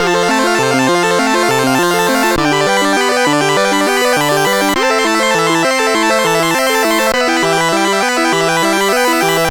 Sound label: C64 Chord, 8-Bit chiptune